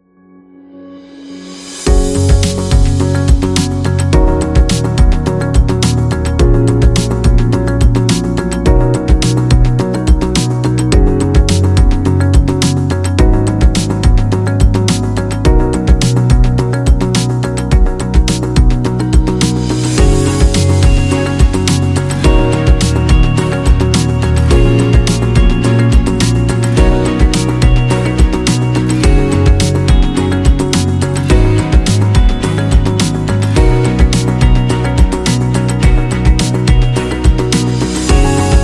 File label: Luxury Corporate; corporate music vol 21